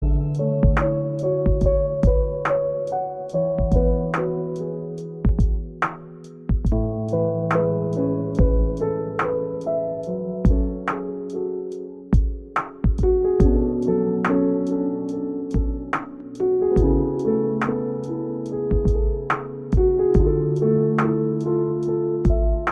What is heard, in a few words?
Lofi; Spirited Away